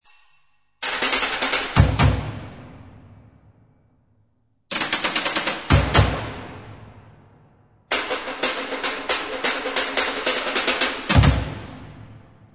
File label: improvisation latino drum together